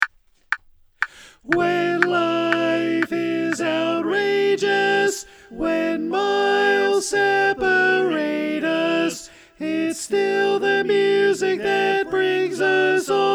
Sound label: Tenor